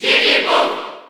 Cheer Spanish; Jigglypuff; SSB4

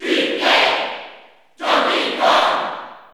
Cheer English; Donkey Kong; SSB4; SSBU

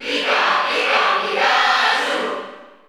Cheer Spanish; Pikachu; SSBU

